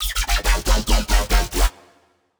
intermittent

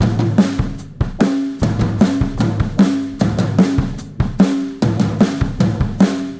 Tape Loop 150

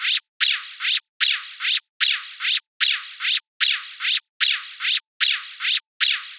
Zipper Hype 150; radiance

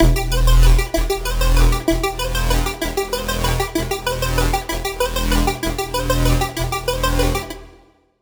arp

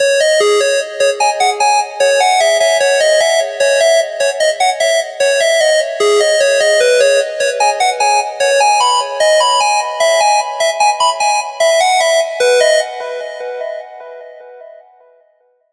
sword of light

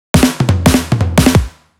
fill staircase 174; breakout

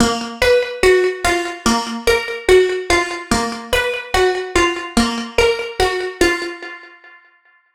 circus; mutant

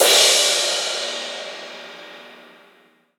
crash

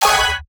Cloud Oneshot Abmin

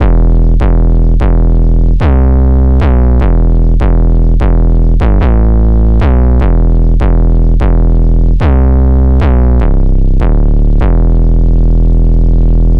808 gut punch; goliath

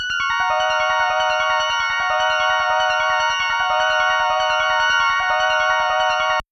chord lofi

